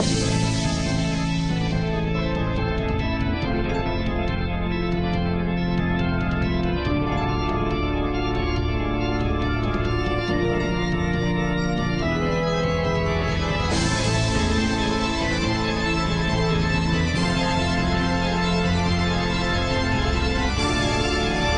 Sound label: music; BALLY TheatreOfMagic